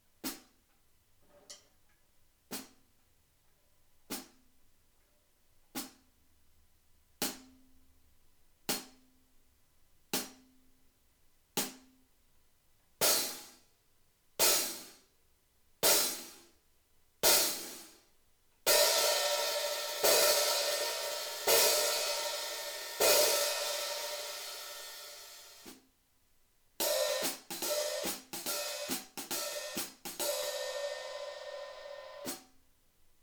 Hi hat 14; hihat bekkenset; Amedia; Ahmet Legend

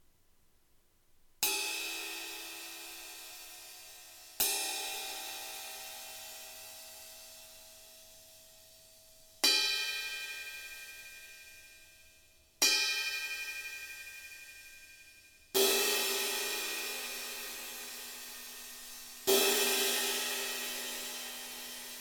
Classic Ride Sizzle 20; ride bekken; Amedia

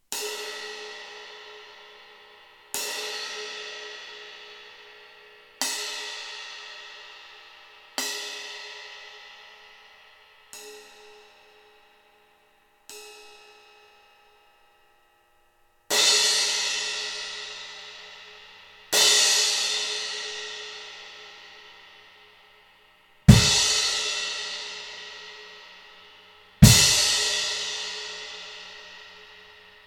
Crash 16; crash bekken; Amedia

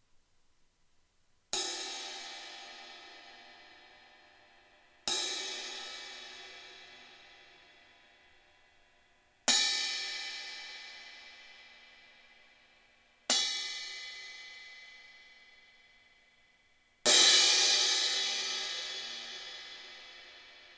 Vigor Rock Shiny Crash 18; crash bekken; Amedia

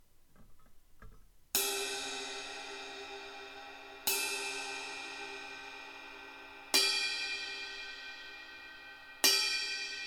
Classic Ride 20; ride bekken; Amedia

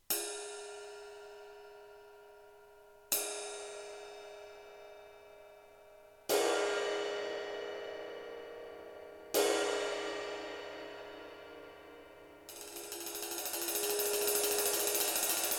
Flat Ride 20; ride bekken; Dervish; Amedia

